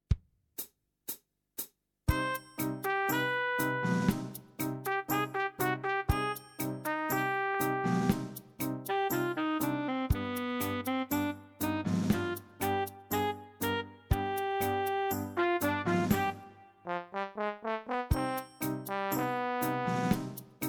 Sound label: latino minus bass